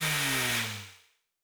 servo big, sci-fi sounds, mechanical

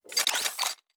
Weapon Reload (Rocket Launcher); Sci-Fi Sounds

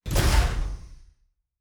Door, Sci-Fi Sounds

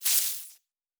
Spark, Electric

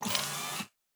servo small, sci-fi sounds, mechanical

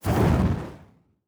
Weapon Shoot (Flamethrower); Sci-Fi Sounds

